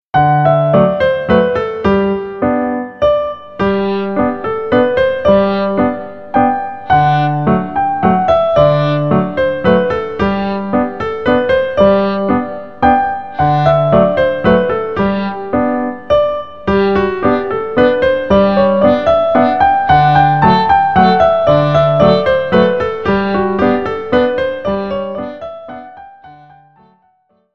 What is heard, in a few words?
Slow Waltz, Viola; Fiedel-Max Vorschule Viola